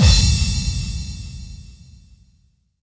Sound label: BD-FX, Dance Mega Drums; Best Service